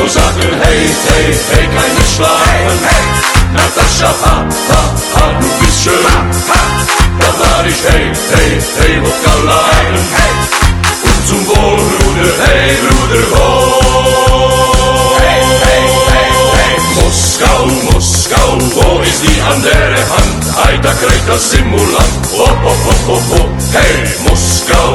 music

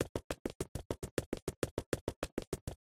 emote celebration foley; fortnite emotes; Athena

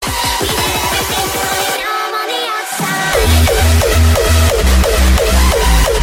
hardstylezz

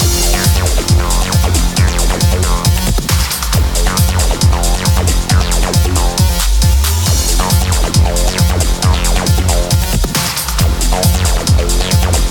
emote intensity music; fortnite emotes

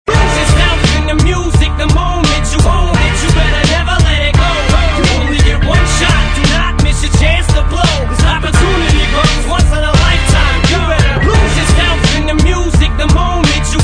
lose

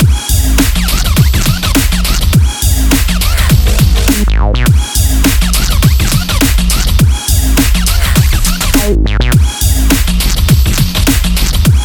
Music Emotes Korean Eagle; fortnite emotes; Athena

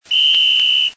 pisk

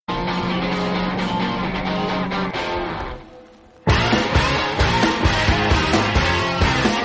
guitar

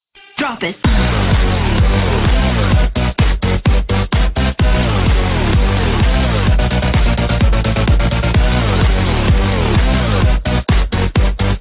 music; jailbreak leyawiin

